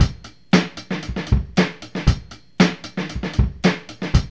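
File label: 116 bpm, Funky loops; Zero-G; Funky Elements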